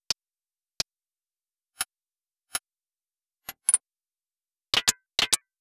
switches

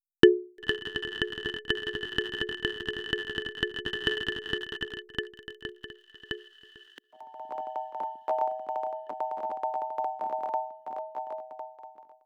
rolling

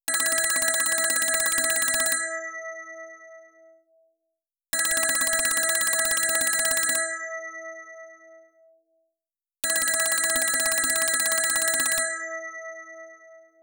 telephone bell

